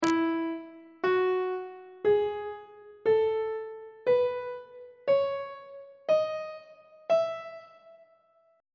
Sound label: f flat ionian mode on treble clef